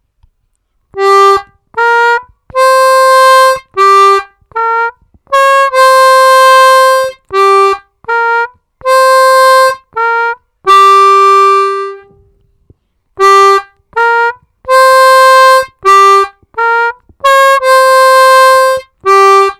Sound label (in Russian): Играть рифф, губной гармошки; 2-3’+4 / -2-3′-4’+4 / -2-3’+4 / -3′-2